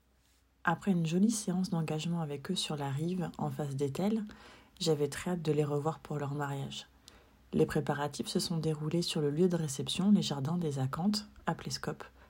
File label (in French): Vocal mariage